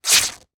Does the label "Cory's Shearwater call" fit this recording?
No